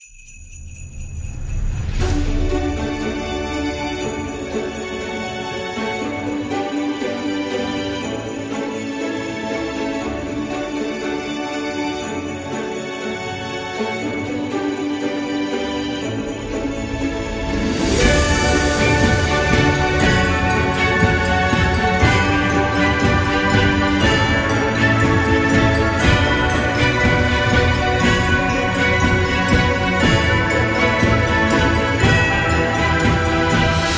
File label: Christmas Background; snow